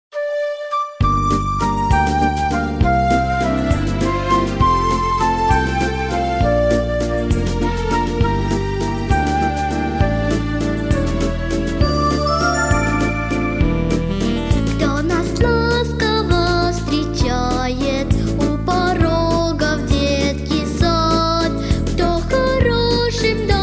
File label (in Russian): pesnja pro detskij sad